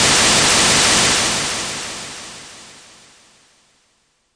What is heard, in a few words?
rs7000 hhcy g6, Yamaha RS7000 Cymbals; DRUM MACHINES